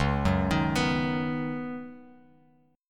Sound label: C sharp 9th Suspended 4th C sharp down Bass Standard, chord; C#9sus4; arpeggiated; JGuitar